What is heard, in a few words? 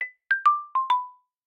Falling Leaf, notifications; Samsung; Galaxy A56